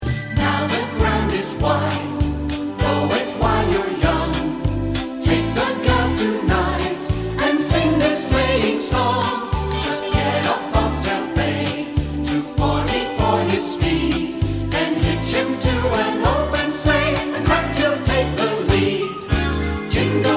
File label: Jingle Bells, Piano, MIDI; chinese; kid; hymn; xmas